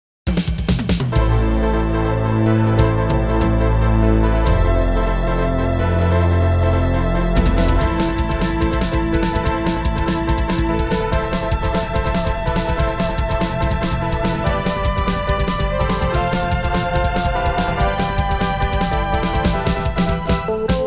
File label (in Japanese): 八部衆 バトル; All Sounds of Illusion City